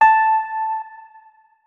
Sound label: notes, keyboard, electric piano